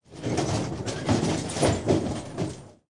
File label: rocket reload